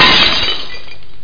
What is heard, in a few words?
GLASS